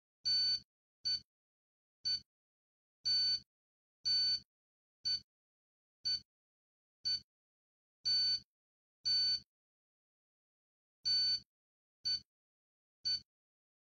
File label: DCF77 Analyzer Clock Live DCF77 Sound